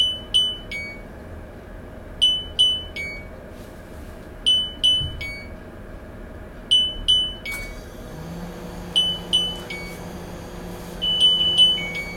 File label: beep rhythm